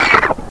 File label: sword penetrate; cstrike; kza lagster